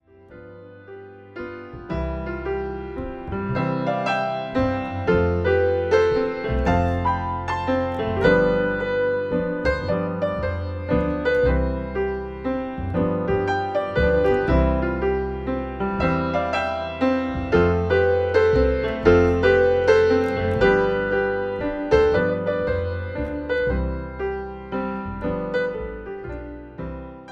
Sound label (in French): Duo piano et contrebasse